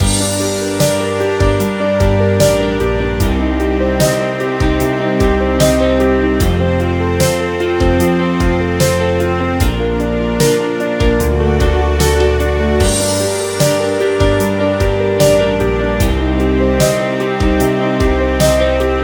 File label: dramatic guitar comp drum; by burak sound bank; dramatic dram vol 15